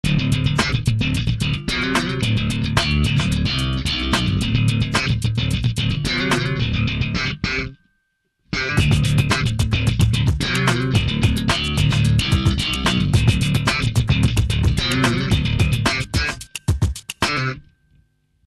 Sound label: distorted funk, bass